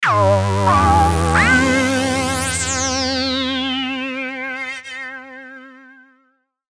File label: Logo Distorted Alien Warble; Sonic Logo; Blastwave